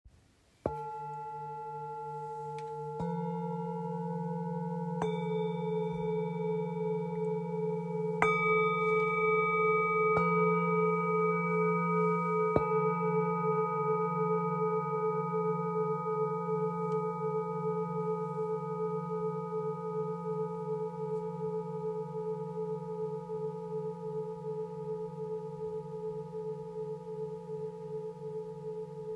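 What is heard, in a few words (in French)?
Set, bols chantants tibétains; brillance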